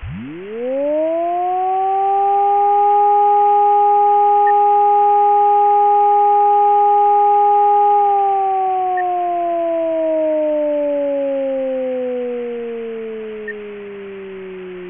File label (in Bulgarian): Въздушна опастност; национални сигнали за тревоги